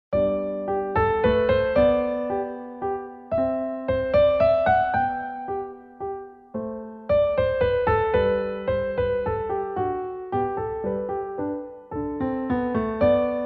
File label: Bach Minuet G Famous; J. S. Bach Minuet in G; Anna Magdalena Notebook